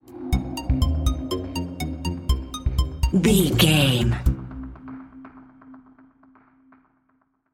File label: mysterious mission video game stinger